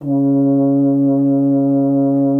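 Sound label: French horn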